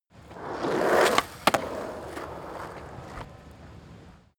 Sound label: skateboard single drive jump